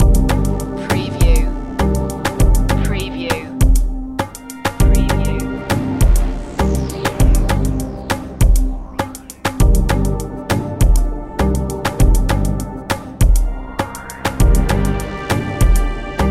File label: the clockwork loop